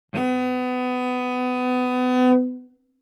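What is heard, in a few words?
Cello arco fortissimo sul D B3; MIS Pitches - 2014; Strings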